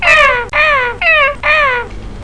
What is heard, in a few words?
dolphin; Wonder's Greatest Toyshop on Earth